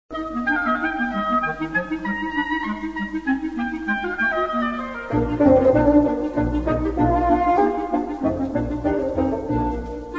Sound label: divertimento gavotte; j haydn